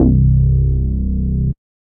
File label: Sub Knock Bass; Synth Bass; Ableton; Factory Packs; Singularities